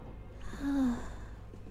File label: breath; IRyS